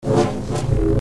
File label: Double Bladed Saber Sound; FXHome